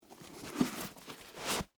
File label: Boot Put On; Foley; Dressing